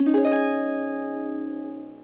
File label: harp